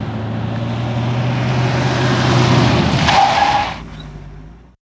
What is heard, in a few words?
Cadillac In Medium, Skid To Stop